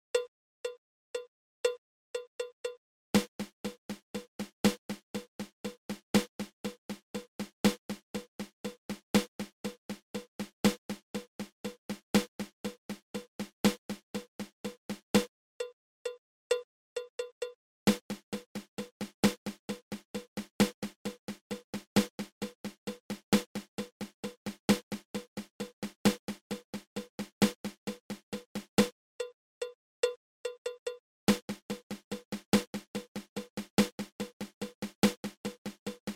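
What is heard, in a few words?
double paradiddle, snare drum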